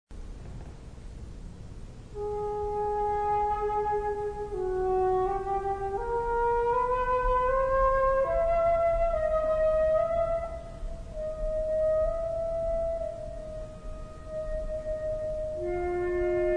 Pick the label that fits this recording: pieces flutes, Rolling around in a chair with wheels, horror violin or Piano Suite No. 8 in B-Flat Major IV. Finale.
pieces flutes